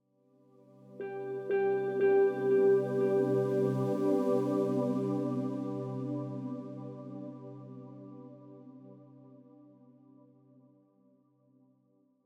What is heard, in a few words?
90bpm Cmin Bbmin